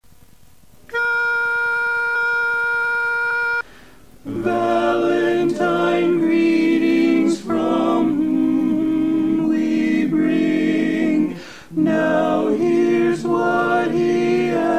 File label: TENOR Greetings, barbershop quartet